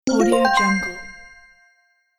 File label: positive correct bonus alert